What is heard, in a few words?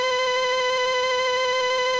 charge loop; mmx sfx